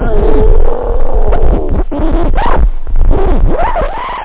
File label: scratch; da capo vol1; instruments